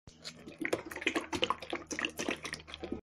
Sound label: oil, mechanic